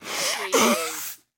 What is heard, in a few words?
Nose Blow Male